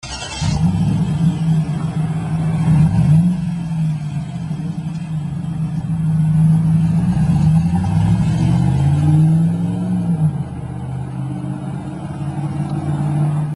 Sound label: exhaust system chevy silverado; cgs